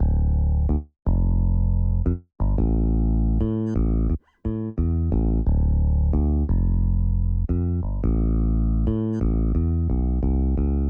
Classic Melody Loop - 88 BPM A Min Bass; Cymatics; Oracle Sample Pack

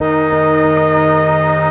Melody Shot D#, dubstep; One-Shots; Melodies; Murk; Ghosthack; Dubstep Sounds 2025

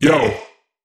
Weird Vox; Cymatics; PHARAOH Beta Pack; Pharaoh Premium Drum Samples (BETA)

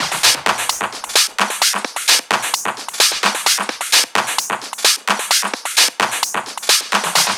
Perc Loop 130, techno; Techno Sounds 2024; Percussion

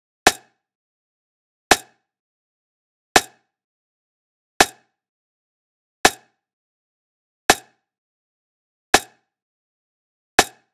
Manual Drum Loop 83 BPM Rim, Lofi; Cymatics; ANALOG EVOLUTION Beta

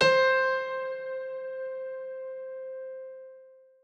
INSTR (C), Melodic One Shots; Blossom

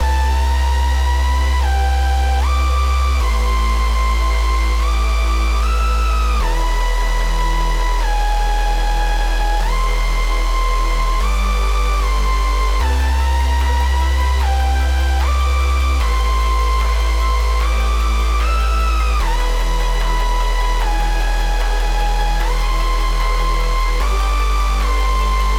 150 BPM E Min, Melodies, Future Bass; Destination

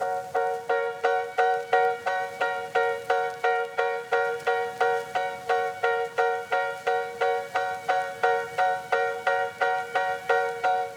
Classic Melody Loop - 175 BPM D# Min Keys; Melodics; Loop Stems; Cymatics; Eternity Classic Melody Loop 9

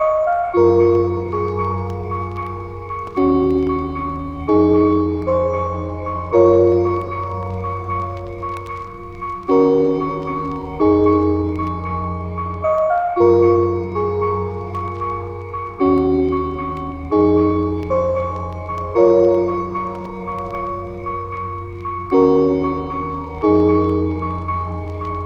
76 BPM A# Min Keys; Lofi; Melodies; Loop Stems; Cymatics; Lofi Starter Pack; Imagination

